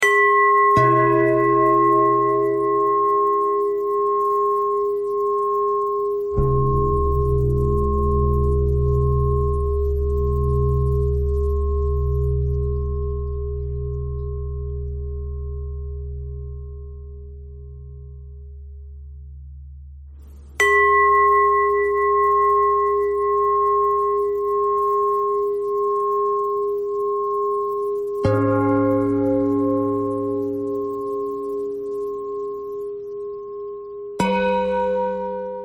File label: bell thread pentatonic